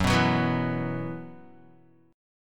Gb 7th Suspended 4th Gb down Guitar, chord

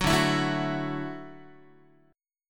D-Major Add 9th D down Guitar; strummed; JGuitar

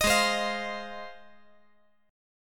G sharp 6th; strummed; JGuitar

